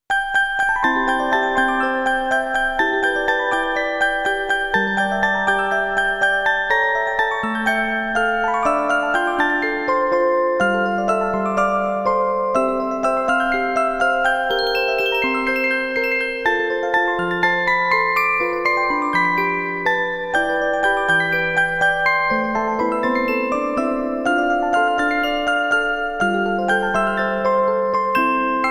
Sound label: bell orgel; Orgel Collection